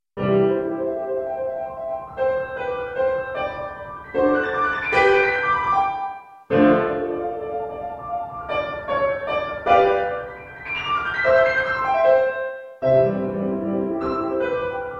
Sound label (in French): etude velocite piano; Charles Czerny